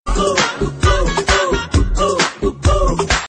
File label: Message Ringtone